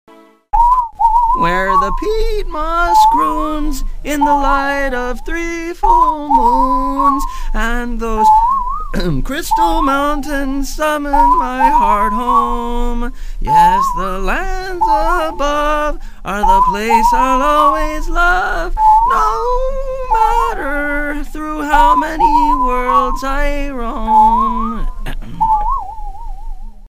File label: Sawplayer; Torin's Passage; MS-DOS